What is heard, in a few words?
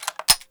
357 shells out, weapons; svencoop